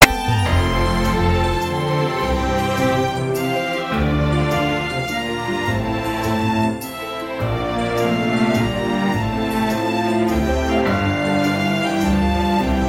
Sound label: Romantic